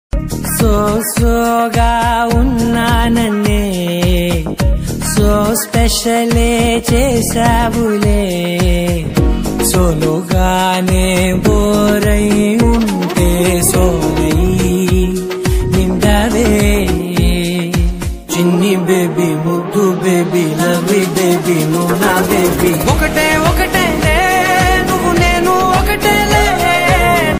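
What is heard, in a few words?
song background music ringtone; manchi rojulochaie movie; maruthi; santhosh sobhan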